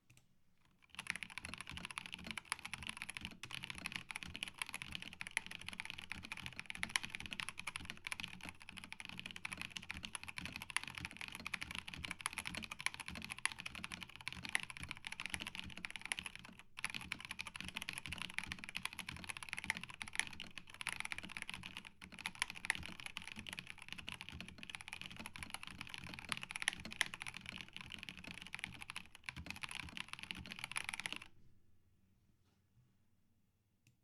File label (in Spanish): Sonido switches; Logitech G PRO X TKL